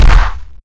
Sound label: crotch slam; Kung Fu; Kung Fu Construction Kit